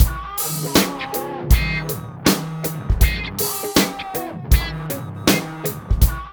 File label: MIX, Funky Ass Loops